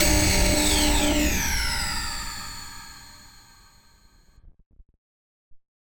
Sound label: Battered Synth; malfunctioning synth samples